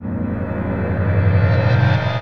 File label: FX Creepycrawl, Drum N Bass; Sonic Foundry (Sony Creative Software); Knutrix Drum & Bass